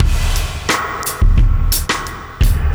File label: Beat, Loops